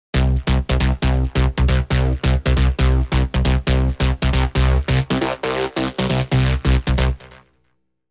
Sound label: Underwater, rolling bass; Underwater Unicorn; Revealed Serum 2 Rolling Bass Vol. 1